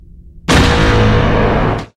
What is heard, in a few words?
Seismic Bomb; star wars